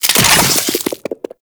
Infested Beam Pistol Empty Clip; Weapons

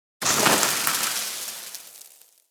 Spark Shower; Ambience; Props; Fortuna; Warframe; Venus Open World; Venus Solaris United

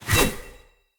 Dagger Whoosh Heavy; Weapons; Swords; Fey

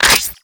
Shape Blast Shotgun Reload; Warframe; Weapons; Corpus

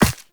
Walk Ice, footsteps; Warframe; Operator

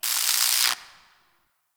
Destruction Electricity Sparks; Corpus Railjack

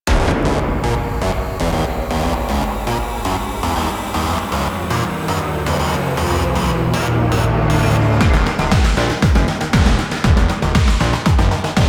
Infested Tunnels Combat Cue; Warframe; Dynamic Music; Cues

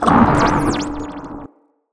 Moa Investigate, Vocals

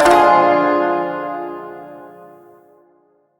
Shawzin Min Chord B; Chords; Warframe

